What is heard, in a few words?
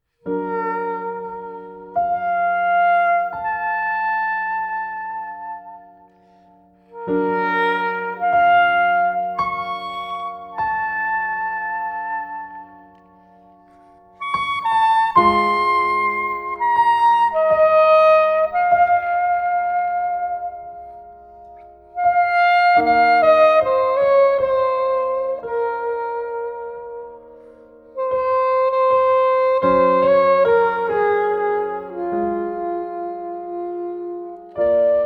Soprano Saxophone and Piano; Suite for Soprano Saxophone and Piano; Part III